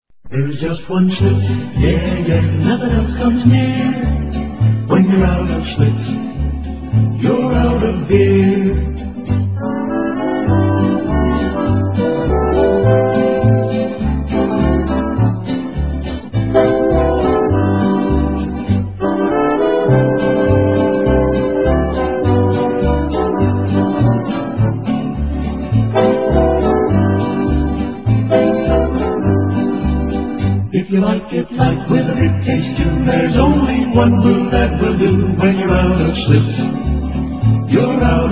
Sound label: beer radio jingle; schlitz